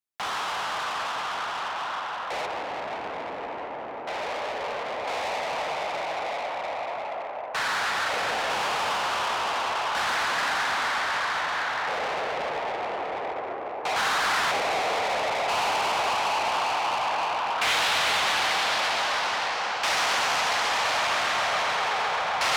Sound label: music noise